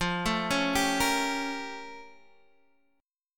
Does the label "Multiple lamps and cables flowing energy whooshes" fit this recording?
No